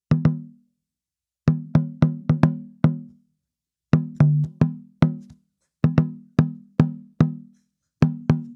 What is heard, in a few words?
Tambora, African